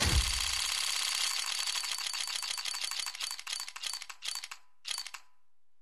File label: case Open; Не бойся я друг